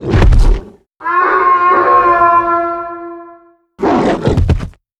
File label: Monster Spawn Initial; Monster Ralph Spawn Initial